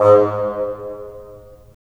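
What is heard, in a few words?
WND BN, wind bassoon; LA Composer vol. 3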